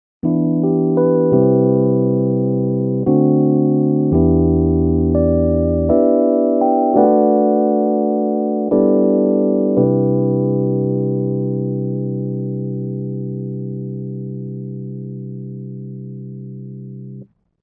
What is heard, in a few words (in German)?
Korg SDD 3000 E Piano mit Effekt; UAD